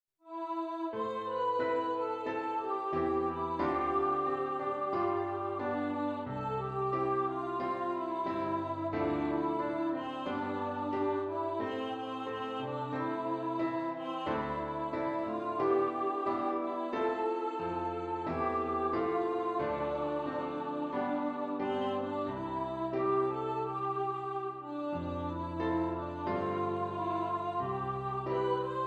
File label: My Brave Little Girl PIANO; a coming of age musical